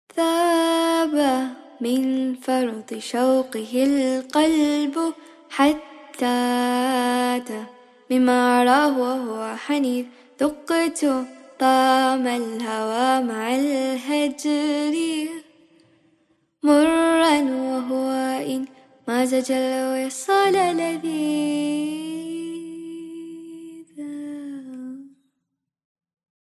Vocals

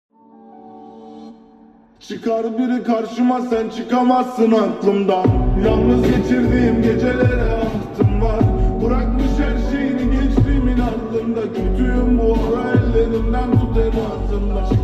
Slowed + Reverb + 8D Bass

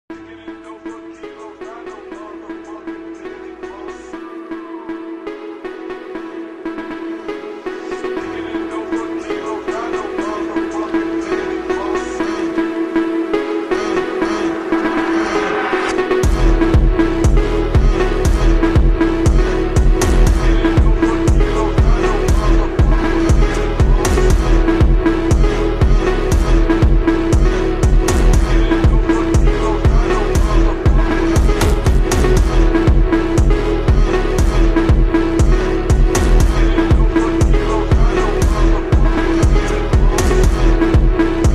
SLOWED VERSION PHONK

